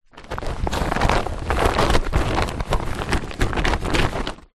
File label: tarpaulin large sheet folded movements; foley; zapsplat